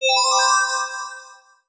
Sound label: match medium; Audiosurf; chimes